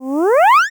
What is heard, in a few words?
riser short